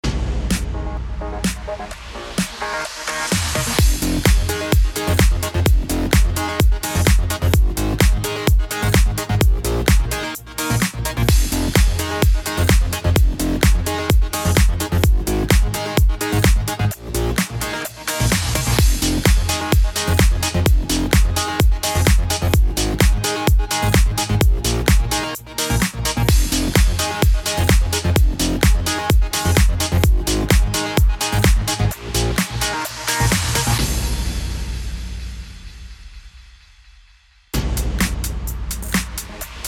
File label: BED ELECTRO HOUSE FMIN 128BPM